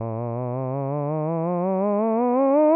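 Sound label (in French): A, un "a" d'une voix de basse, variation de la fréquence fondamentale de 110 à 330 Hz, avec vibrato; sans vibrato; AteliersHenrich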